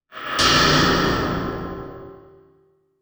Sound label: snarelike; Sonic Foundry (Sony Creative Software); Ugly Remnants VOL-2; Beatstuff